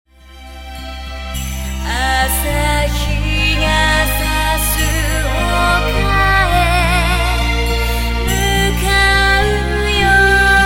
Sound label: Pops